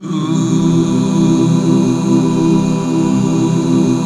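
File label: C major, back chords; Sound & Vision - Gigapack II CD 1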